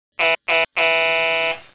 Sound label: Adjust; EWII BDt+7EWII; OpenBVE